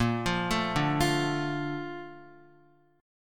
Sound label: Bb Major 7th, Bb, down, Guitar; BbM7 arpeggiated; JGuitar